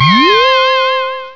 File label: Ding Woop; Total Distortion